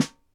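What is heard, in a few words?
snare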